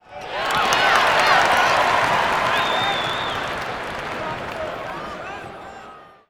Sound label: crowds